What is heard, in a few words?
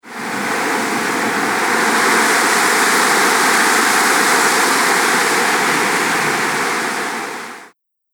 Wind A Short Gust; Outside Ambience; Andrew Fleming